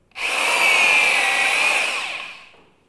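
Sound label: jigsaw start stop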